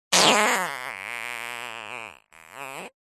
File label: human fart sudden long squeezed